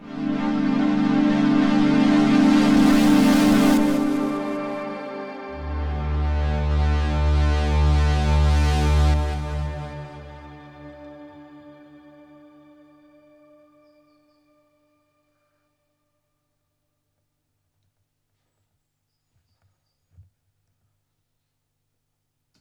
Polybrute 12 pad

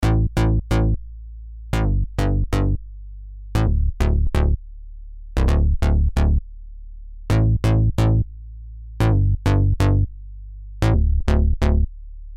Korg Arp 2600 VST Bass

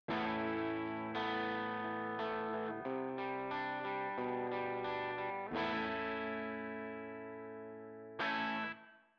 Reverb Room; ToneX Pedal

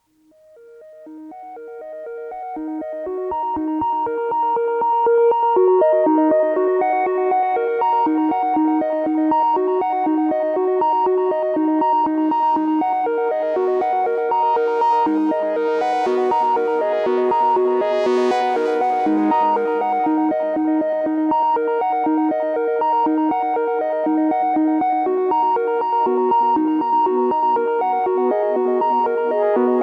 Cascadia Duo Osc; Intellijel

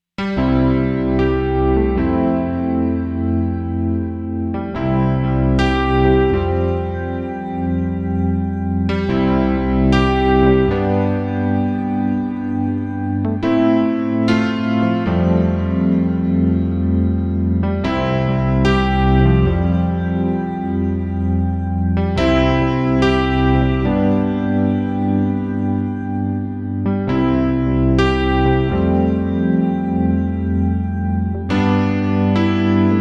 Electric Piano, Electric Keys; Korg Arp 2600 VST; Alicias Electric Keys

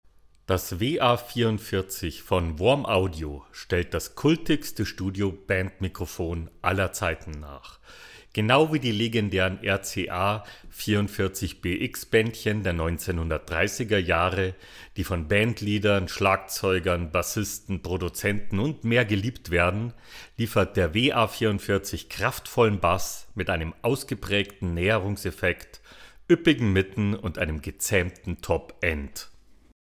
WA44 Lewitt

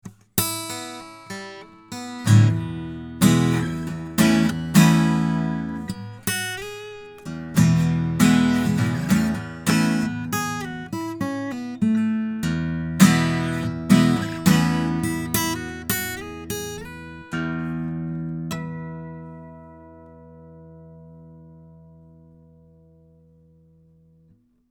Soyuz 013 FET Steelstring

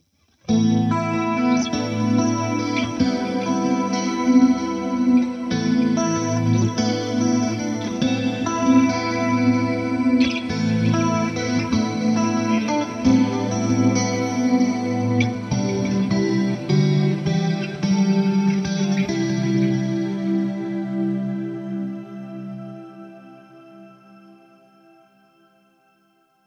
Fender Twin Shimmer Ambient

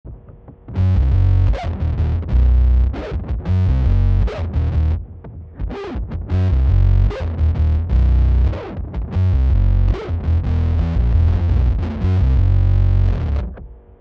Quad Cortex bass sub synth

